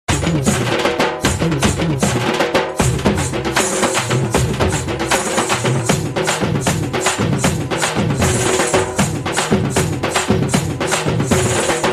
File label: telugu beat